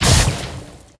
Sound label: beast lava ball splash